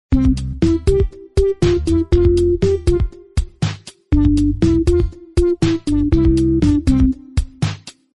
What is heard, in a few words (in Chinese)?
游戏背景音乐